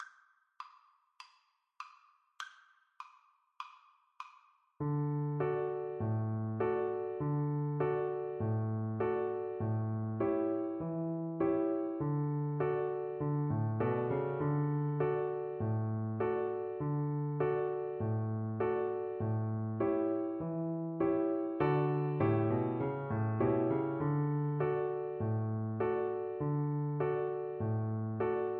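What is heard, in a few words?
VLC karaoke, Cello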